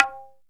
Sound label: Ethnic Perc; Percussion